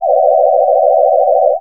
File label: sci fi; Masterbits - Switch FX